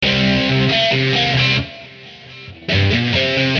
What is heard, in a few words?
C 138 rhythm